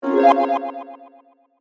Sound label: Stinger Magical, Stinger, Magical, Delay, Glisten; Production Element, Imaging Element, Accent, Transition; Stinger Sound Effects